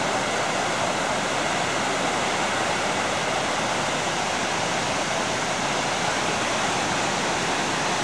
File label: falls